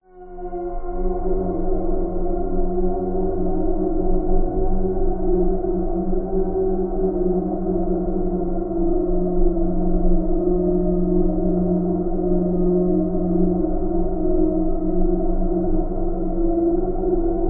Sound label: flashback time warp eerie moody dark; flashback soundscapes; silverplatter audio